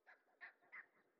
monkeys; dota

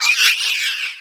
death last breath; deaths; broodmother

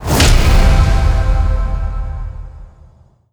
set applied; ui; inventory

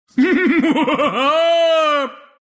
evil cackle; announcer dlc pflax

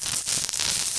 acid spray damage; weapons; dota; alchemist

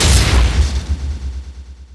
shield crit; weapons; dota; mars

